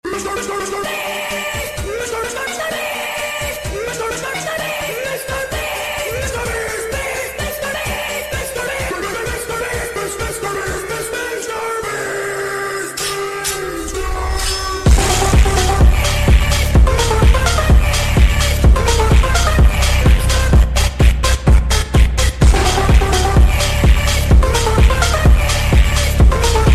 Rap Phonk Remix; MrBeast; ringtone

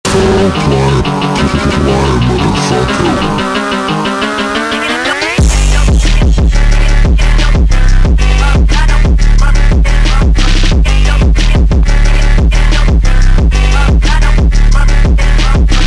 Phonk

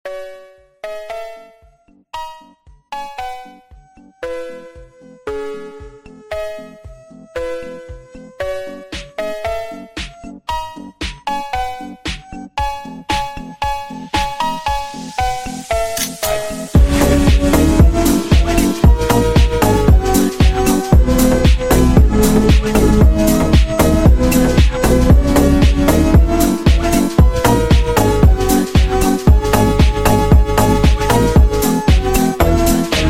Phonky; ringtone funk; آهنگ زنگ فانک

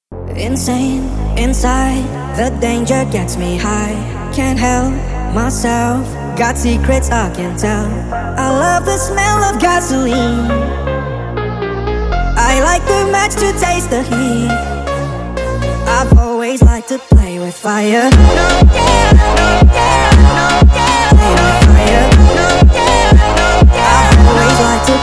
phonk sped Up

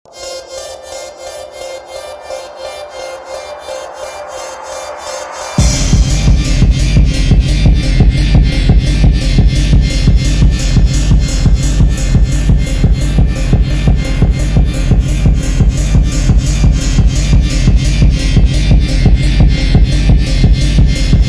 House Phonk 8d Drift Music Phonk Remix

